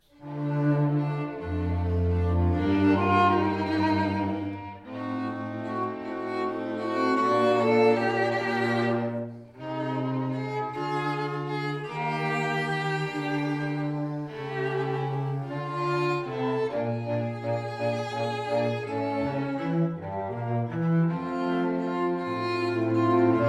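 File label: string quartet D major; asplmayer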